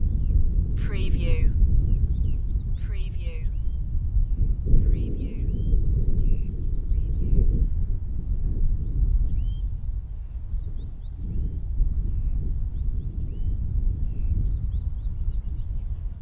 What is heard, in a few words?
Wind noise and nature sounds on windy moor; beanstalk audio